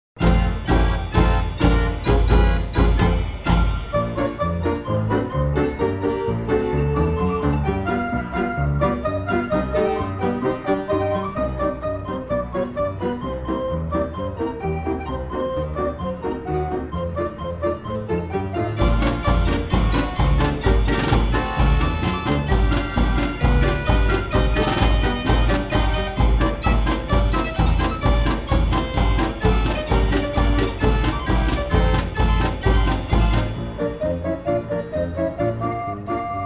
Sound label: Wurlitzer Model CX "Mechanical Jazz Orchestra"